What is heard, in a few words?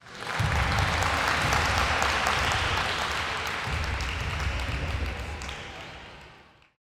Applause, Clapping, Crowd Ambience; Sound Ex Machina